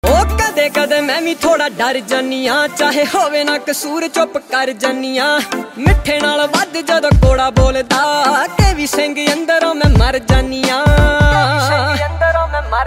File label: Punjabi